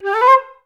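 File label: flutes C4; Best Service; Peter Siedlaczek's Advanced Orchestra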